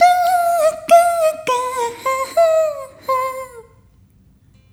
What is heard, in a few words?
Rhythmic C